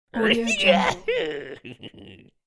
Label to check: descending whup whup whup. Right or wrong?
Wrong